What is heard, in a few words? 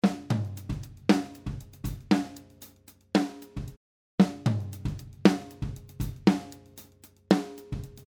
Snare aligned not aligned; X32 Drumrec Mix-Evo